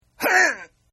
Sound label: Grunt; Grunts; Voice; Partners In Rhyme